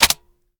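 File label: sniper reload empty fast mag in; gmod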